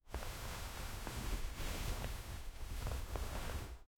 fabric

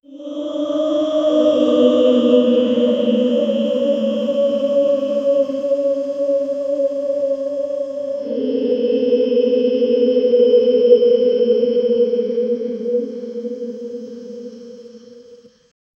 spooky and creepy hallowe

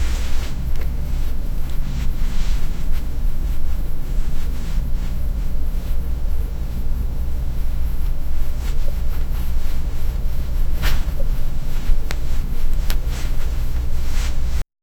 soft playful sounds, indoor

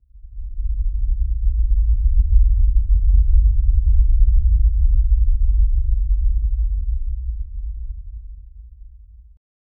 a low rumble of the; universe

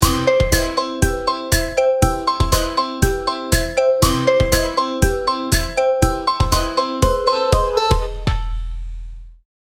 kid music intro

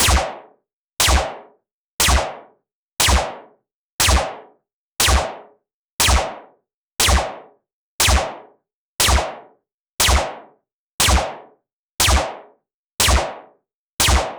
sounds of shots from vari, automatic

